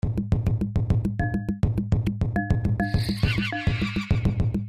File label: African beat; Animate